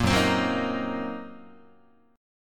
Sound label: Gb 6th Flat 5th Gb down Guitar; strummed; Gb6b5; JGuitar